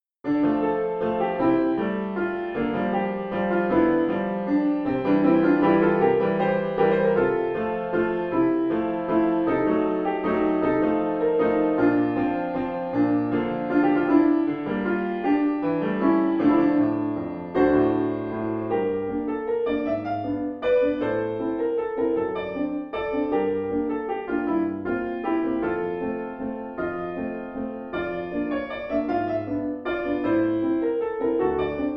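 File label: Cuban minute waltz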